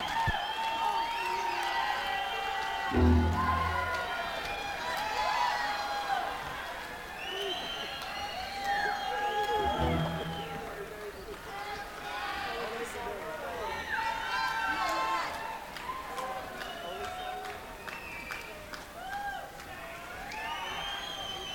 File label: Crowd; sony ecm270